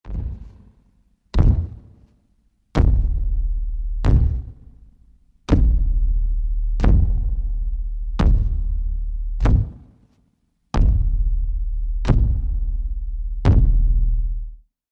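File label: sounds of giant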